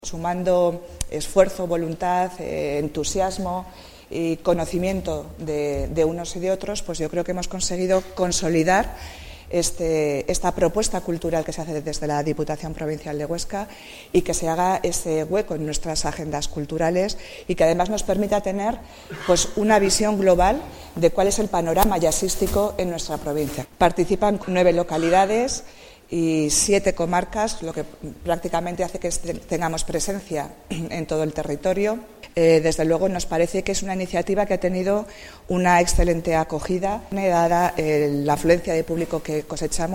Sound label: Jazz; AltoAragonJazzTour